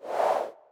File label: Move In; FoodyGO; GUI Animator